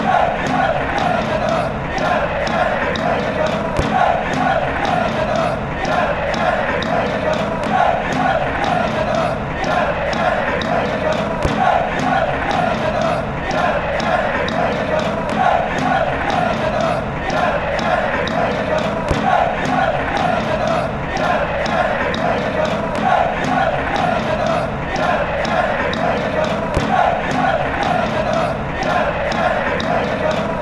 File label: catolica chant